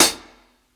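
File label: 60s HH medium; Basement drums vol 2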